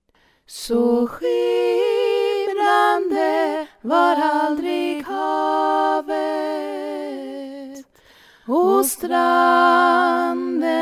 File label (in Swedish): sopran